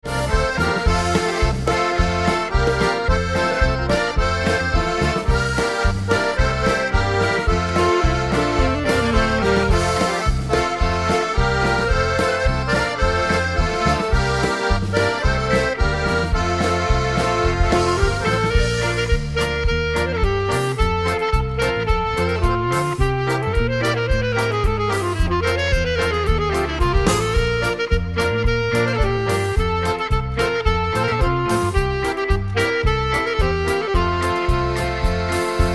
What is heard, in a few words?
Polka